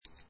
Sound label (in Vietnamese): Nhạc chuông tin nhắn tiếng bíp 1 tiếng